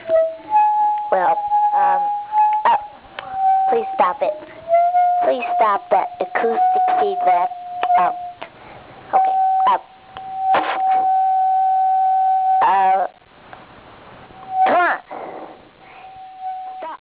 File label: acoustic